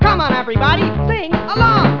sing along

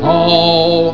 ohhh

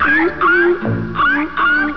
arf arf

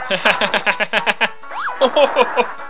laugh

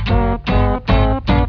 mouth trumpet

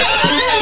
screechy sound

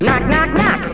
knock knock knock

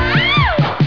scream

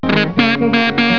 kazoo

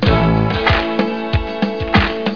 rhythm

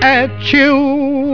at-choo

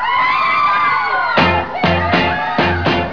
screams

